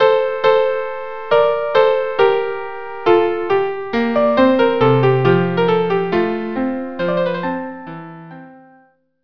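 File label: andantino violin viola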